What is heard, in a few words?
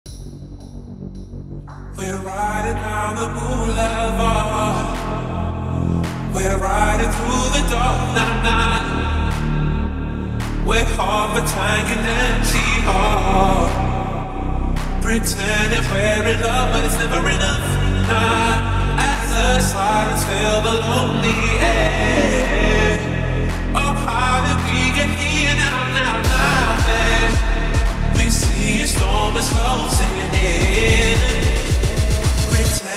remix